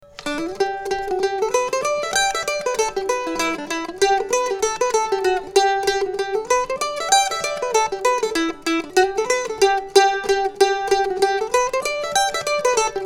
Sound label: c f martin co a style